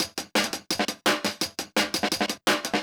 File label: hat shuff 170, perc loops, drum&bass; EZRollers